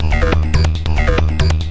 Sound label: 140 hexbeat, DRUM LOOPS and BREAKS